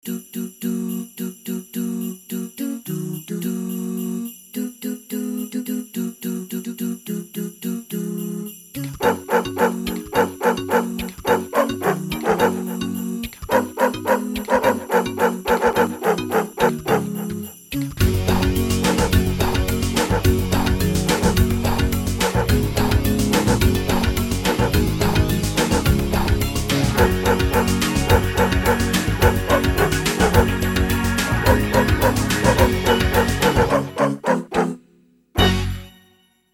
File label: jingle woof